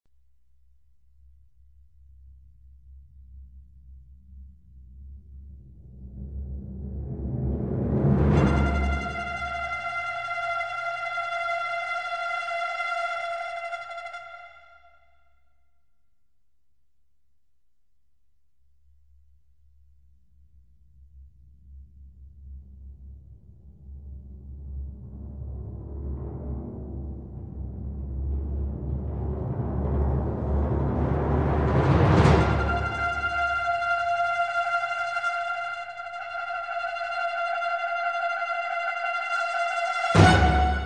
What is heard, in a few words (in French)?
cuivre